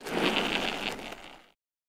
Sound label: skating; CosmicRage; CosmicRageSounds; Added a skating sound